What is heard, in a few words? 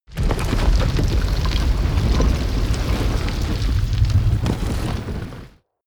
gate stone medium open